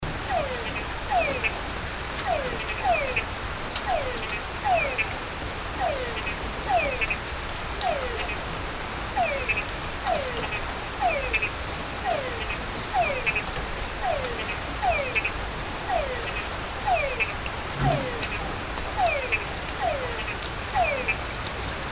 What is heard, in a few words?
Tungara Frogs, Panama